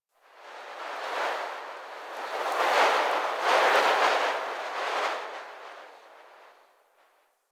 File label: Wind Gust, Ambience Plains